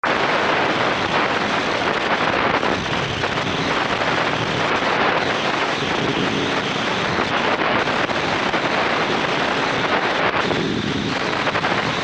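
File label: Static Heavy AM Radio, static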